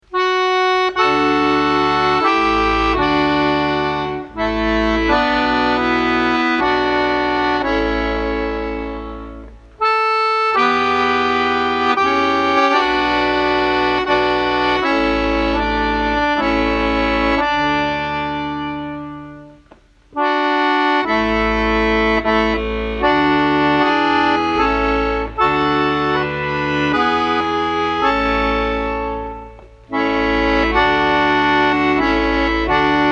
Akkordeon Arrangement; Macht Hoch Die Tuer

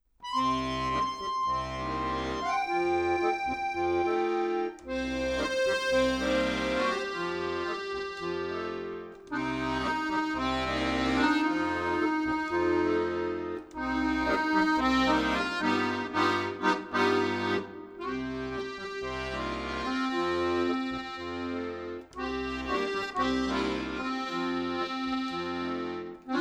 Blue Monday Bossa Akkordeonkomposition

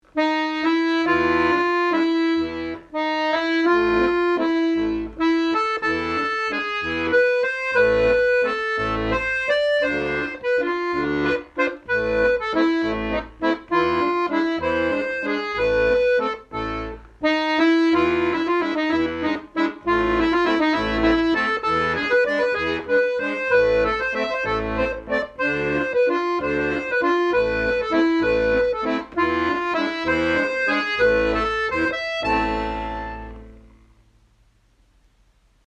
Akkordeon Arrangement; Schwarze Augen

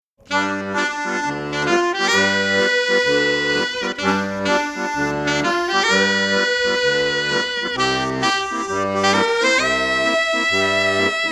Duett

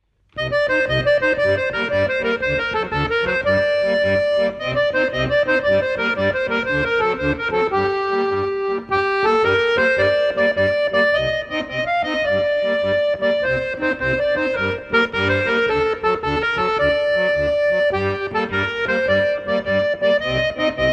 Tarantella Akkordeon Arrangement

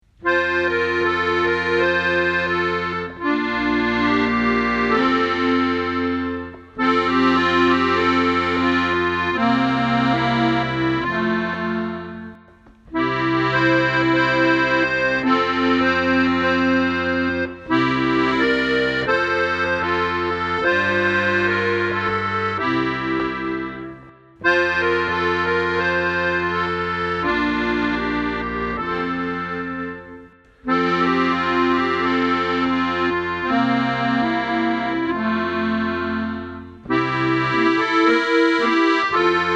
Akkordeon Arrangement; Froehliche Weihnacht ueberall

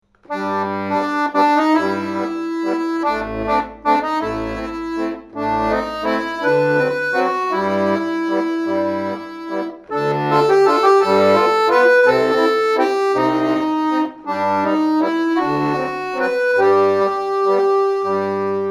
Petit Four Akkordeon Komposition

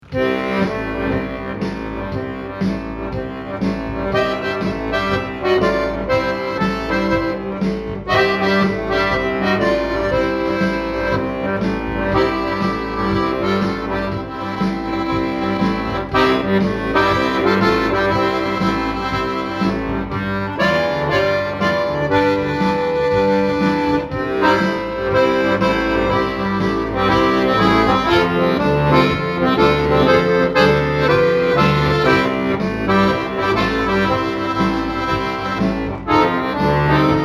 Akkordeon Komposition

